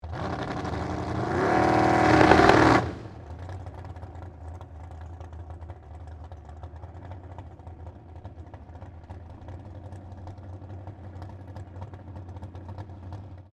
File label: Dragster Rev, Sports, Dragster, Rev, Engine, Single, Idle; Race Cars; Blast Wave